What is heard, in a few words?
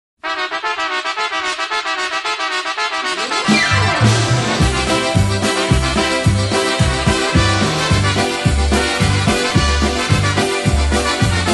Polka